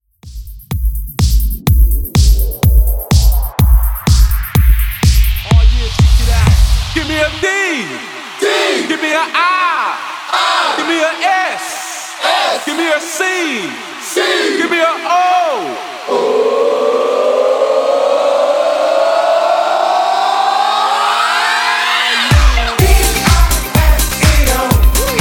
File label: Disco Classic Redrum 125 Bpm